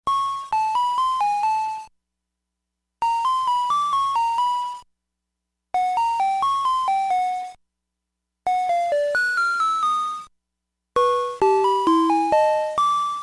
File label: Broken Music Box